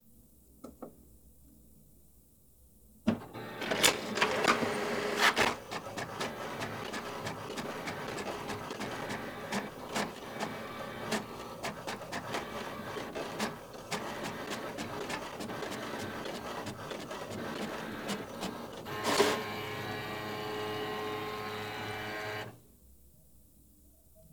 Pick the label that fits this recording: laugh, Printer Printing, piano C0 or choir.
Printer Printing